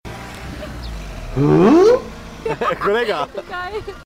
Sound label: hummm